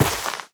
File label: step, footsteps player grass